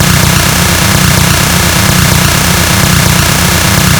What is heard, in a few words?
minigun fire